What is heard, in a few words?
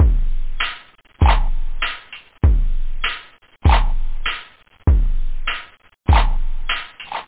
fete, loop house; da capo vol1